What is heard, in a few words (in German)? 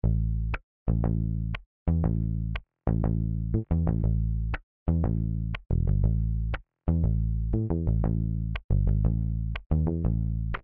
Bass Charakter